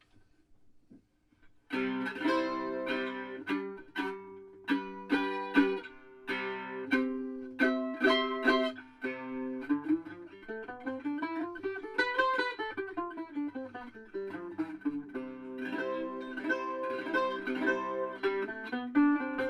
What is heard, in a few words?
mandola plugged